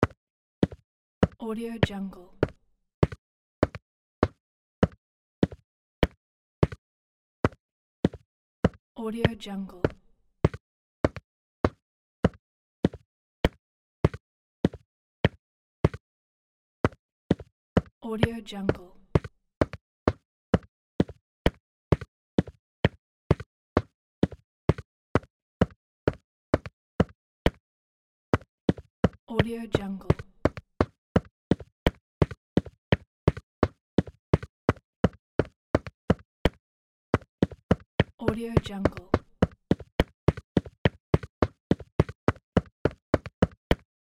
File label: steps; human sounds